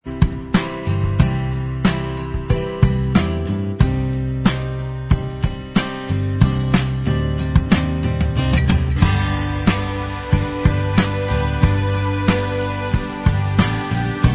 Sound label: Inst Track